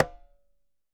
Congas Tumba mute; Best Service; Festa Latina VOL-1; Single-Hits

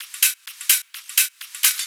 Hihat Loop 128 BPM

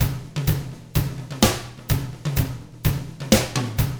127 Drums

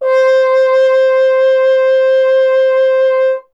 F. Horn; Miroslav Vitous; Solo Instruments VOL-2

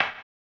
HAMBONE, Vocal Blues Soul; Spectrasonics; Planet

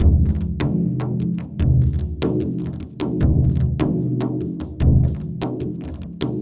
Frame Drum Harmo, Ethnic Percussion; Sonic Foundry (Sony Creative Software); Ambient Atmospheres & Rhythms

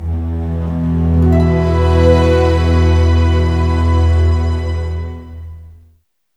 CLOCK; East West; Scoring Tools; ORC; Clock Time

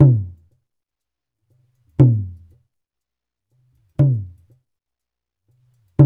JU JU, African Contemporary

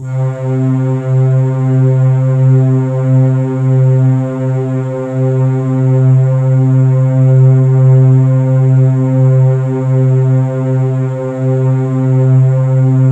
C1, Synth; 90 s Sample CDs; NiceBeats; SynthLine VOL-15

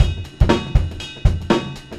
Breakbeats loop, 70_s Breakbeats; USB Soundscan vol.46; AKAI

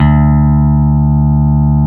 SLAM BASS D3; Drums In Your FACE!

